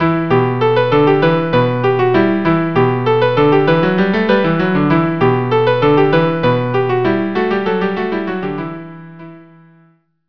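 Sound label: violin cello; Freylekh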